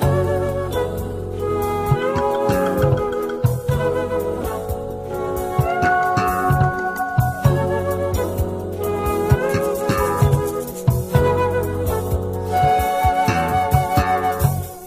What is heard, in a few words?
flute tension at the disco, Loops Phonky